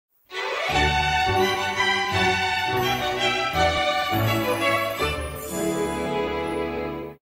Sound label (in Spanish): Tema de Victoria; Yoshi; SSBU